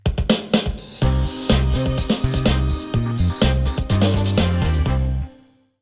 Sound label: Disco